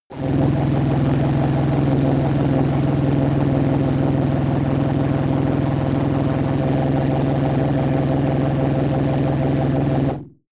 noise rumbler; musikelemente; drones

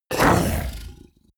coloss groan pain short; kreaturen

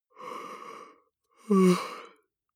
male yawn vocal soft; koerpergeraeusche; menschen; soundpool

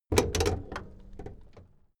car camaro open hood

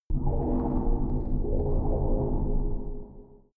space alien ship water pipe; raumschiff

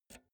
pull out jack multi socket quick; objekte; elektronik

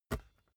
basketball catch gentle

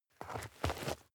step dirt heavy boots scuff short; menschen; schritte

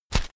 whoosh shredded paper; swooshes-swishes

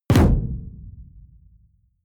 sci-fi shot tonal; schusswaffen

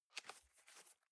cardboard box open unfold single; objekte

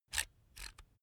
electric drill template adjust soft; maschinen; bohrer; soundpool

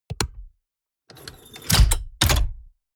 mechanics medium snap; industrie wirtschaft; mechanik

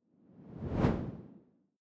whoosh simple low; swooshes-swishes

